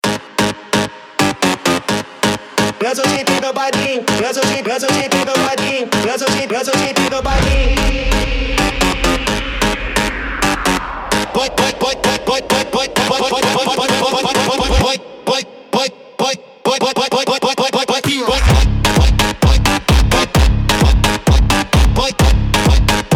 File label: phonk